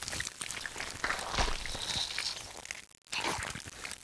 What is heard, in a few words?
idle, weapons; tfa cso; awpz